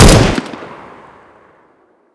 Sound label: g3sg1 fire; weapons; cstrike; csgo